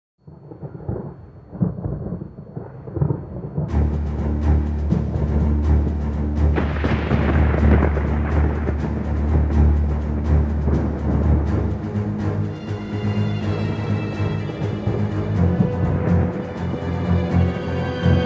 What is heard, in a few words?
String Music; Yu-Gi-Oh! The Duelists of the Roses